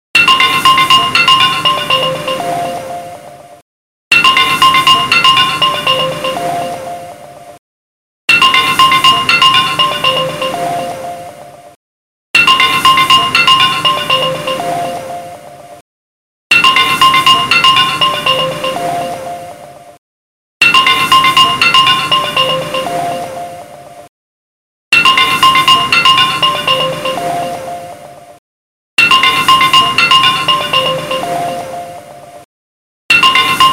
Nhac chuong Vsmart mac dinh

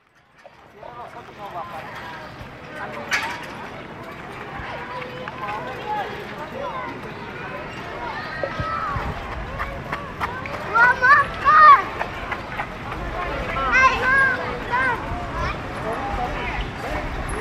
tieng on ao o san choi cua tre em

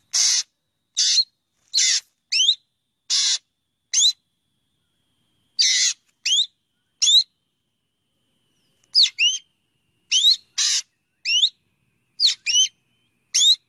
Tieng chim Cheo Beo

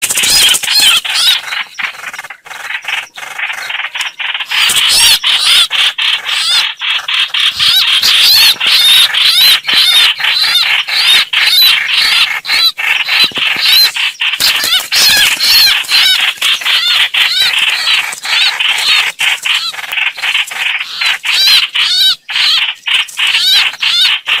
Tieng chim Choc Quach

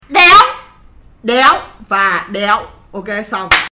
Am thanh Deo deo va deo PewPew

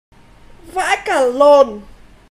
Am thanh PewPew; vai ca lon

